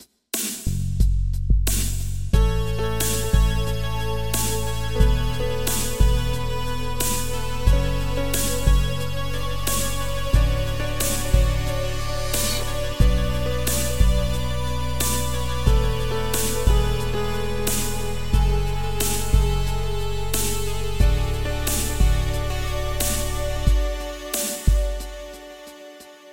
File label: Pop